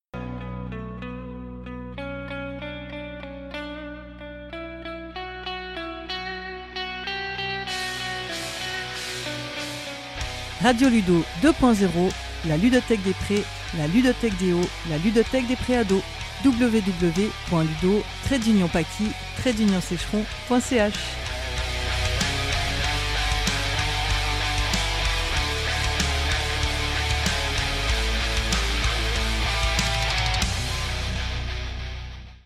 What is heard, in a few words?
Jingle